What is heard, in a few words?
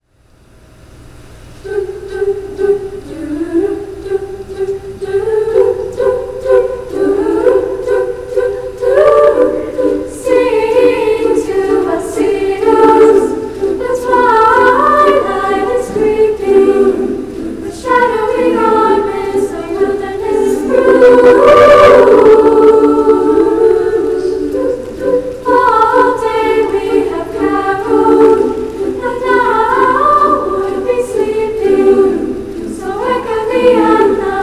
birds lullaby, choir